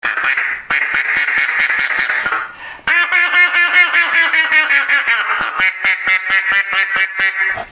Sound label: duck call